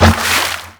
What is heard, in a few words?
Aqua Board Land; Warframe; Yareli